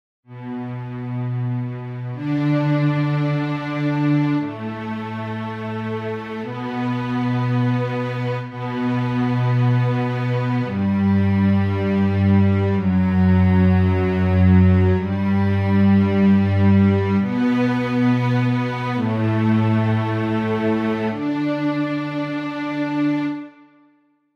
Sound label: Strings